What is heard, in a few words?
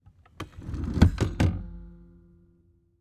Transportation Seat Full Size Van Seat Front Passenger Slide Back